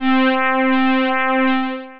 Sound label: Talking Vibrato Keys, Synth Pad